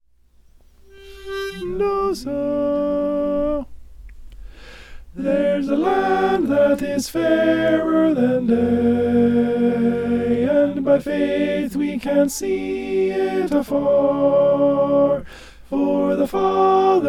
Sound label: alto